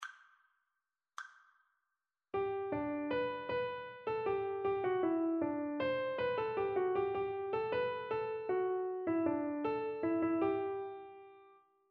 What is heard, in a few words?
rhythmic dictation